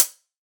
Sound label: crispy hi hat closed sound c key; Tuned drums